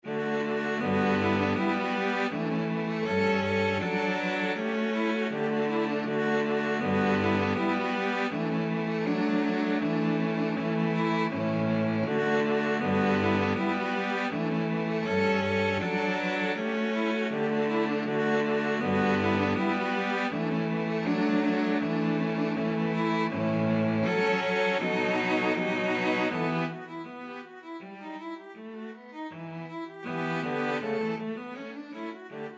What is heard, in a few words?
Gavotte, string trio